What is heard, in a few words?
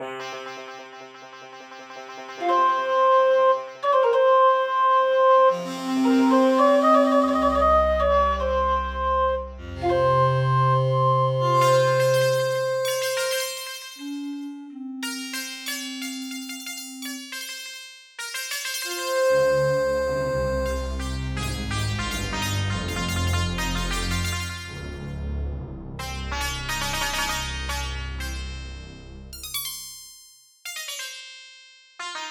Intro, Yamaha MU5; Prince of Persia 2 - Intro